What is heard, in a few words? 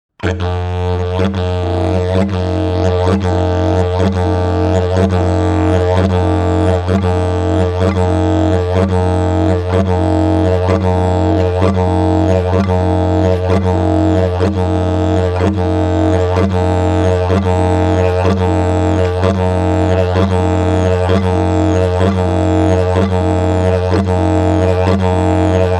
Dith Dhu Fast